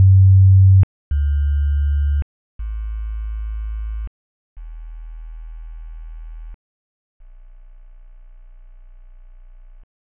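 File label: Complicated Doppler effect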